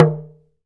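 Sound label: PRC talking drum, Africa; Roland LCDP14 Africa VOL-2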